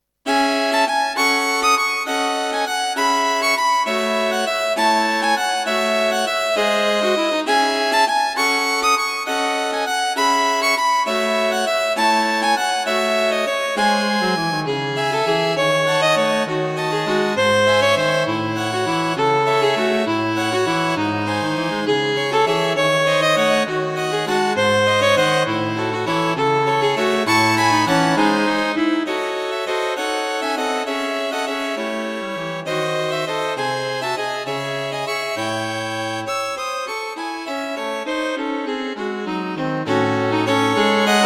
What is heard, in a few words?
String Quartet; String Quartet for Concert performance; In recognition of the work done by the British NHS.